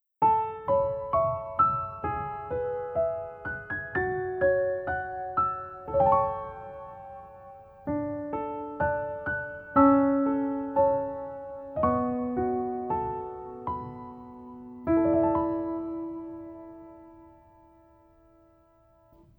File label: Piano; 슈가맨